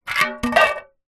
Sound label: foley tin small empty no lid with water in down on ground; zapsplat